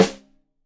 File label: Rim Shot Stereo, drums